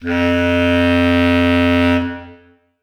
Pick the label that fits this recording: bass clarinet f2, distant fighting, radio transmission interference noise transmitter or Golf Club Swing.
bass clarinet f2